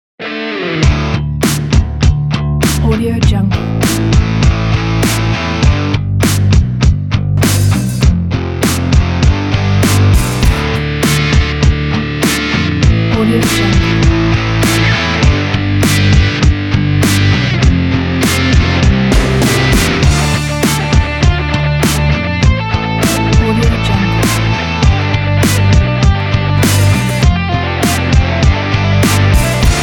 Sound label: Cinematic Rock Background